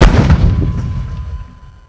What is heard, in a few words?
tank light fire